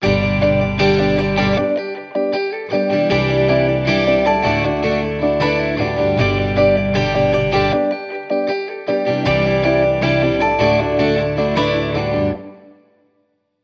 Simple Days Of Joy, No Drums

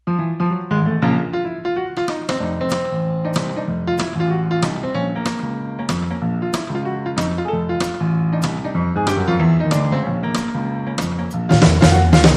Clean Instrumental

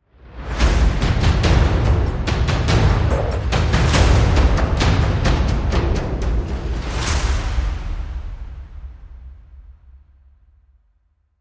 Drum Sting

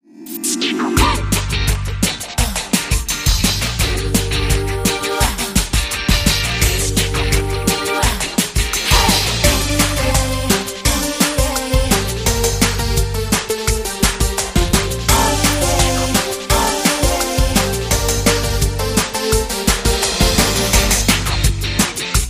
Pop Rock Chicks

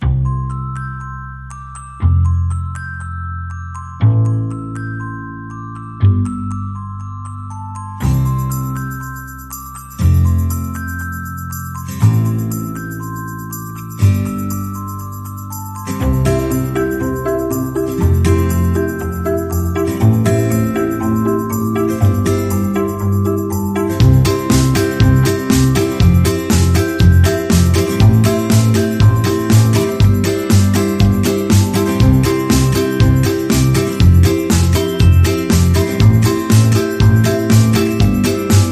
Upbeat

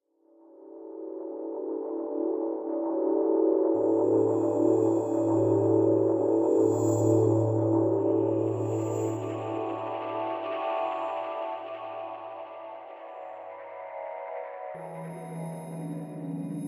Revival Drone Ambient

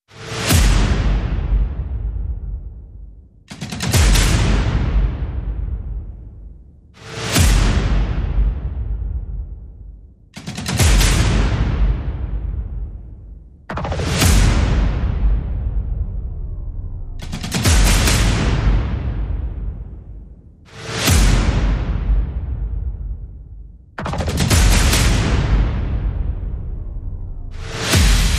Percussion and FX

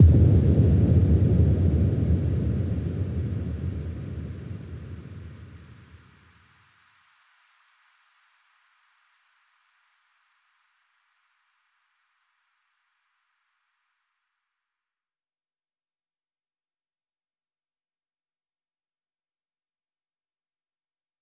Backstop, Noises

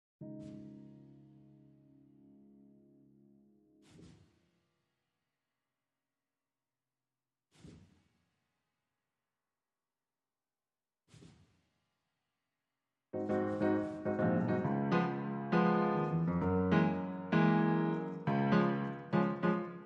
Keys Clean

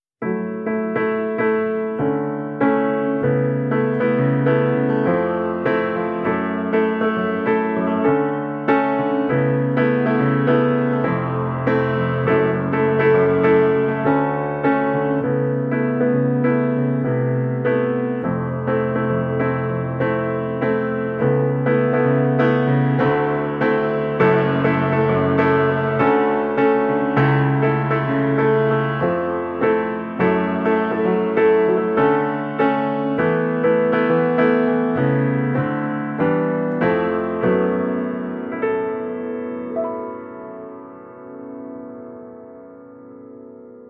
Piano

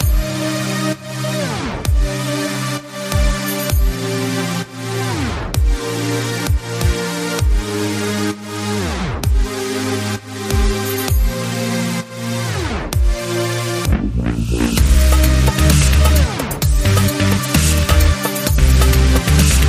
Balkan Dubstep

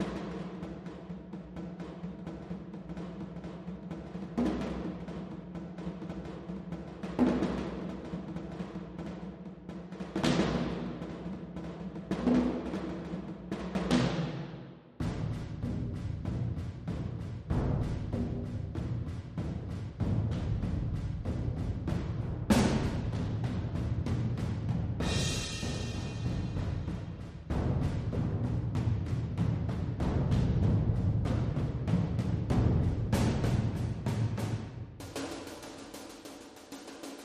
War Drums

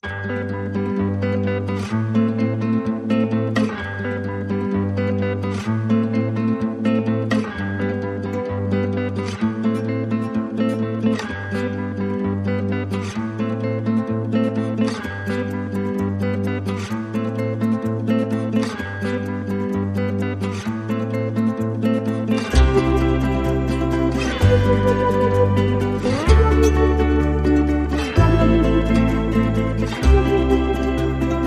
No Melody and No Vocal

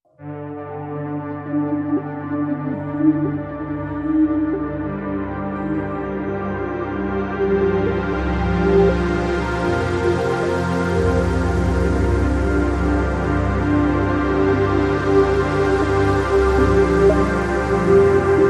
Ambient

